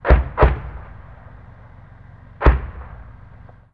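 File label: explosion medium; ModernWarfare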